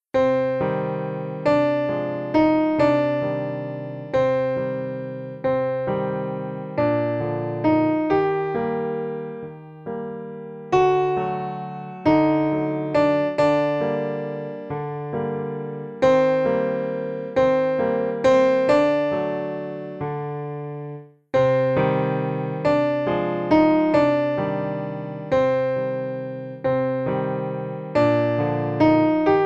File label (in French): Piano seul